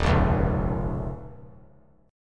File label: Eb 7th Suspended 2nd; 3,5,4,3; Eb7sus2 strummed; JGuitar